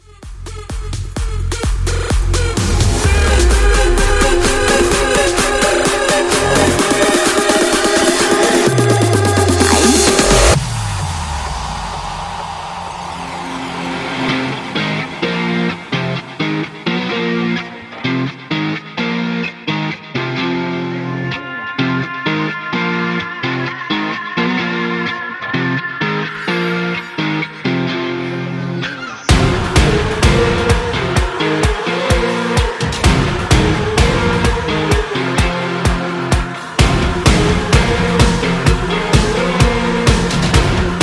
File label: Festival, 128